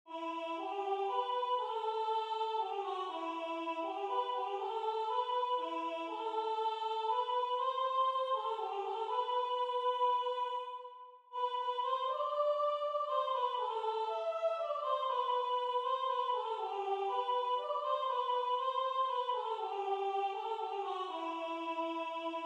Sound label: Kol Mahloket, Synthesized, two-part harmony; This was in two-part harmony.; As recalled by Ernest Davis